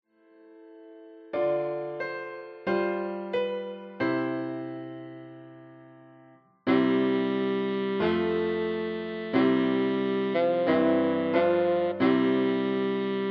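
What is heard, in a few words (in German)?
Tenorsaxophon; O du fröhliche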